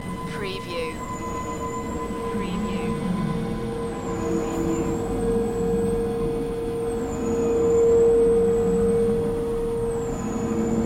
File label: stormy underworld ambience loop